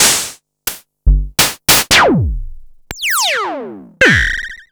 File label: Korg MS-10